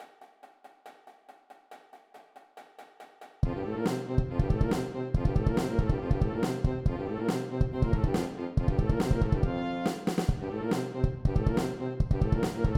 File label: Check patterns winds 140bpm; rhythmic-control